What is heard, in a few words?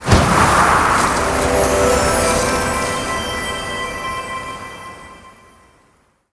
Firework Pollen Boom